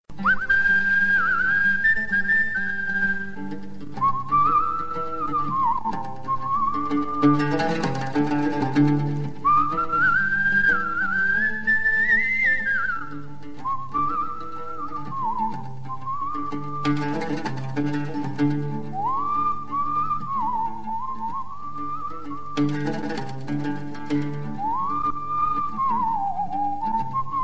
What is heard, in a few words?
Sudanese music